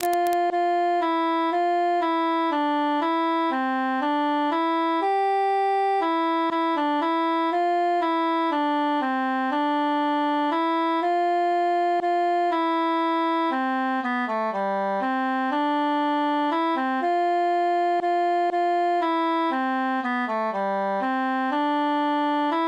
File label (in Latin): cantus solo; post partum virgo ave regina veritatem